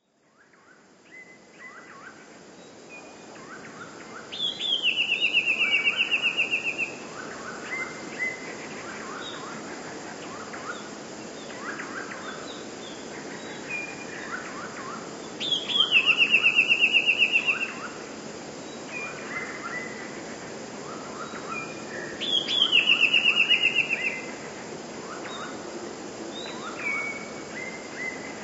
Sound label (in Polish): spiew; dwukrotnym zwolnieniu i obniżeniu o oktawę